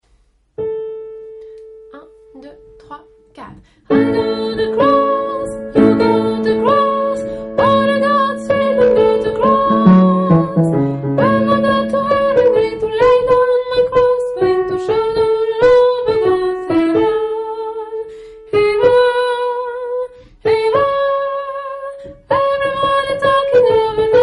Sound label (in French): soprane; Shout all over gods heaven